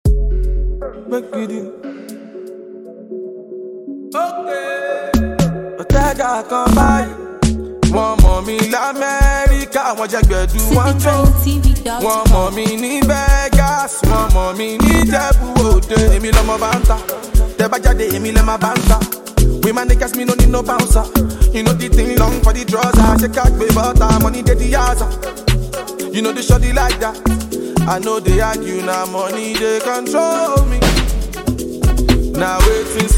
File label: Amapiano Version